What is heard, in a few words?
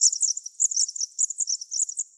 Chirping Birds